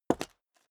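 step high heels concrete grit single left, footsteps; schritte; menschen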